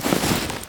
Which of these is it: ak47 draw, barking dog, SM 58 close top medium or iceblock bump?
ak47 draw